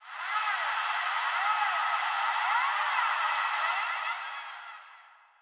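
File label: Dis Mosquito Airy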